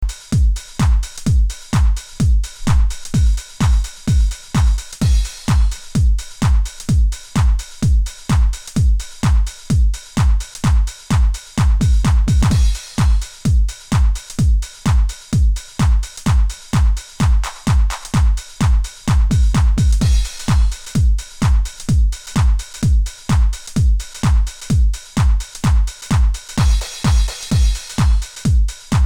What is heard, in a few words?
Drums